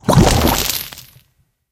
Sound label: Material Lava Melt General